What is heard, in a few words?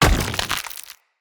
Acorn Impact Dirt; Enemy; Characters; Abilities; Mendicant; Mnd Wazard